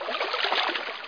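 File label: breaststroke, Water; HereticII; Player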